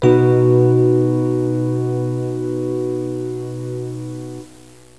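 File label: piano rhodes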